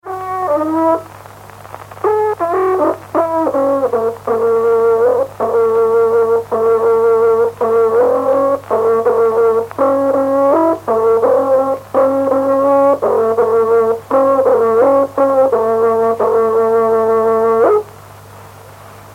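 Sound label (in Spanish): Toque de trutruka, Mapuche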